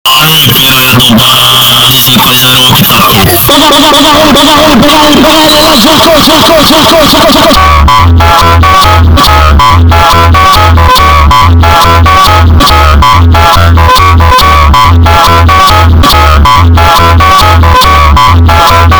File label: Phonk Montagem